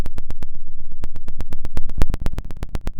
pop, Sound of a fire crackling

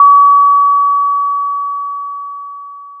Sound of a school bell

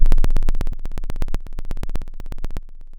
pop

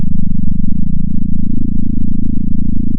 pop, sound of a small motorcycle

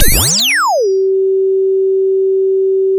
sound of a bike bell

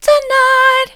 tonight soft, vocal

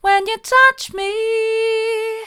when you touch me mid, vocal

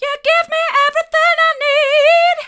hard, vocal

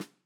Snr, drum

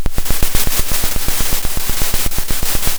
Sound of a drum roll

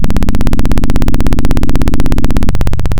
Sound of a plane taking off

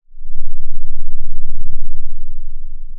Sound of a thunderstorm, center

